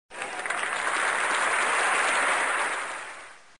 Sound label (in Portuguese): aplauso